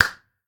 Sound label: clap; smite; gilgamesh